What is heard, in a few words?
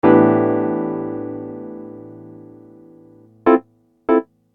Soulfull, Hip-Hop Street Beats; Construction Kit; Sonic Foundry (Sony Creative Software)